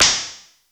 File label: FM SYN PERC; Crypton - DrumBase5000